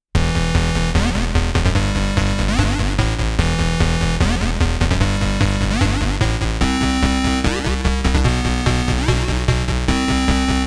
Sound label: Battle BGM; Metal Gear; Metal Gear 2 Solid Snake; TX-55 METAL GEAR